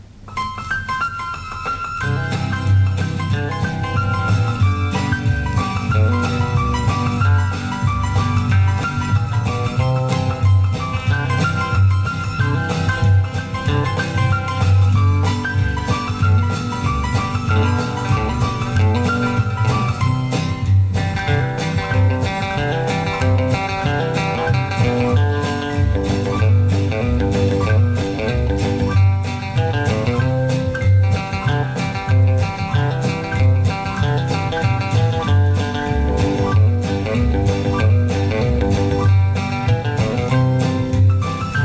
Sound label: cajun on the keys